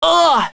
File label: HIT; BattleSNDS